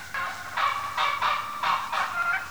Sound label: gobbler yelp; hunting